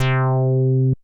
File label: ANALOG, BASS; Best Service; Hyper Dance